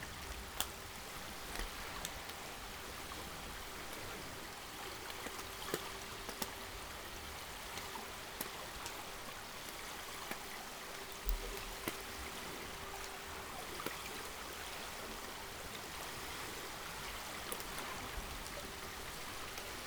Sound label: No Ship, helicopter